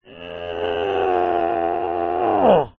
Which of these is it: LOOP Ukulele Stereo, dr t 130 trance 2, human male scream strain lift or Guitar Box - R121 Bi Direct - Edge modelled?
human male scream strain lift